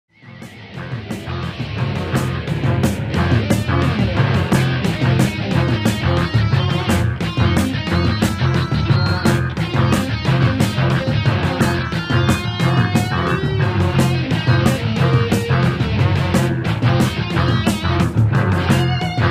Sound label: guitar solo